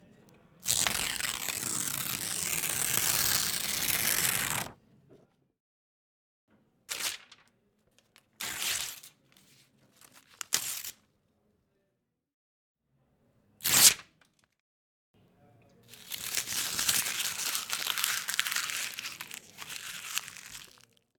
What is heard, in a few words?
Paper Tear Variations On Tearing A Sheet Of Paper